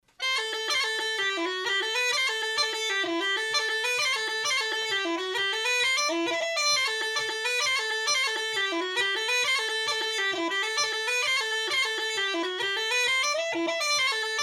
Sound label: jigs